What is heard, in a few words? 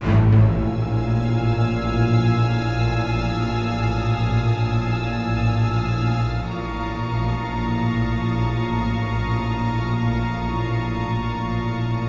String; The Bureau XCOM Declassified